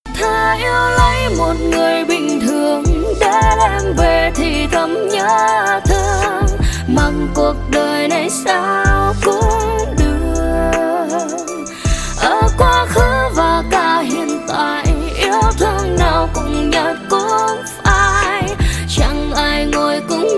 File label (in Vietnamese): nhac chuong cover giong nu